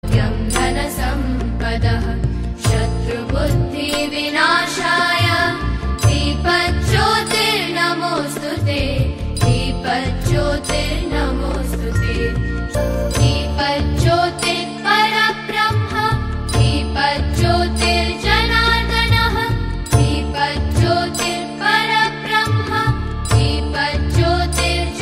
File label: lightning lamp sanskrit ringtone